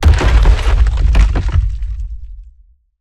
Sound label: Rock Prison Formation; Evolve; Behemoth